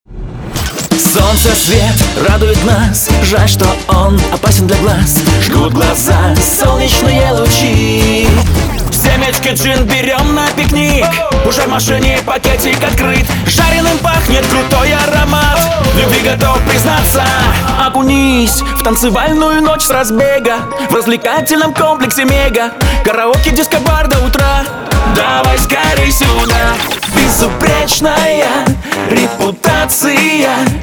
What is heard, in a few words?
Vocal